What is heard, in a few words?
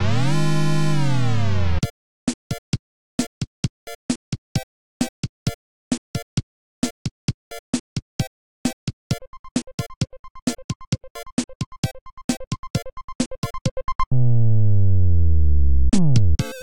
Flyswatter Level 2; Mario Paint